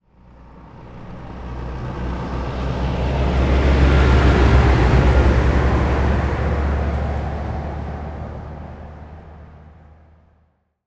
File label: truck pass by slow; transportation